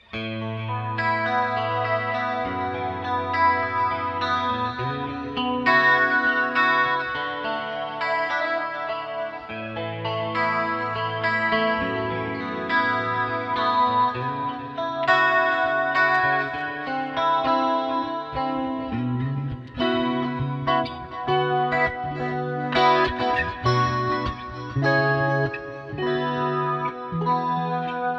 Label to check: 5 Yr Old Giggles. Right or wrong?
Wrong